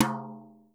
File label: BRSH; Crypton - DrumBase5000; R70 KIT #1